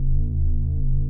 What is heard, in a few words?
mystery lounge loop; Earth and Beyond; EA Games